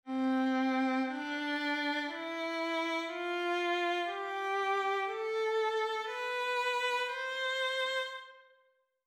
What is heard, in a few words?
scale